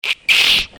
クリーチャーボイス

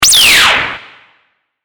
ショット

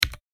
タイピング メカニカル 単

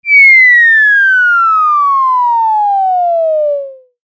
落下

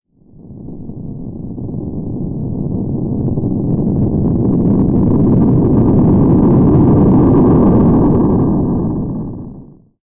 地響き

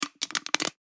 缶 を つぶす

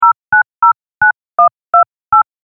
プッシュ音

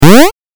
8bit ジャンプ

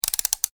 カウンターをリセット

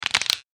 骨折

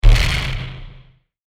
爆発

